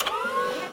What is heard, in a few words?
activate magnet, mono; dokobots